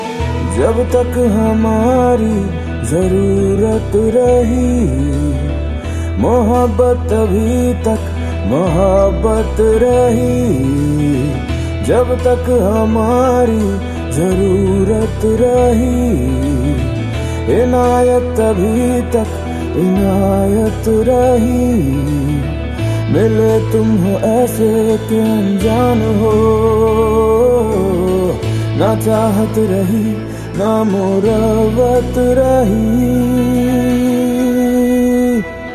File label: Bollywood Sad Ringtone